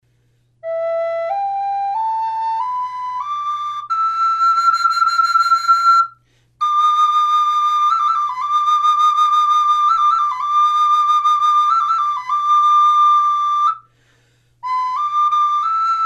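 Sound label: natural, flute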